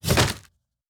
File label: Trap; Survival Game Sounds - Lite Edition; Combat and Hunting